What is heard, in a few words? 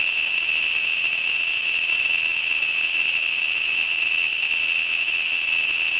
crickets; Star Wars Knights Of The Old Republic II; XBOX